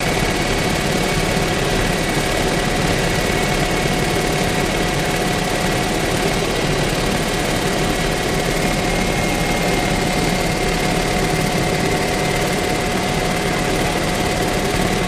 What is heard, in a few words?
Jet Heli Int Constant, jet ranger